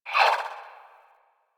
Swing, Melee; Balance One; Warframe; Weapons; Modular Melee

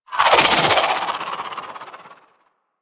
sidearm charged proj, weapons; Warframe; Tenno; Wraith Sidearm

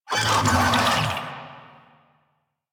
Infested Big Ashen Vocal Attack Death; Warframe

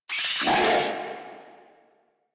Infested Charger Alert; Warframe

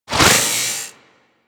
Worker Grinder Whoosh, weapons; Grn Worker Grinder

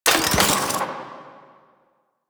Mech, Spear; Warframe; Weapons; Tenno; Priest; Scourge Prime

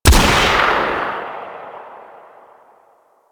Sniper Rifle; Wraith; Grineer

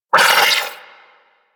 Sentient Battalyst Vocal Pain; Warframe; Sentients

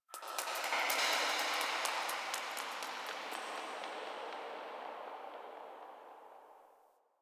Memory Echoes; Warframe; Quests; TheNewWar; Ambience; Zariman

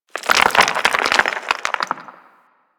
Rock Slide Medium; Warframe; Ambience; Eidolon Plains; One Offs

